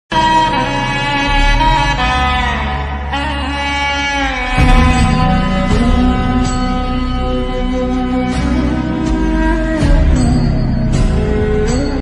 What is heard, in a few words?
chhath puja instrumental ringtone chhath geet chhath songs ringtone